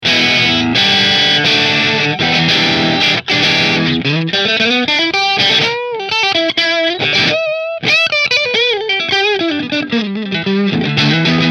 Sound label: Position 1 Marshall; Rahan Green; Rahan Guitars RP Double Cutaway Green Flame Maple Top